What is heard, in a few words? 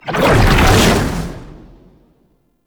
Perfect Heavy Attack Activate, Weapons Melee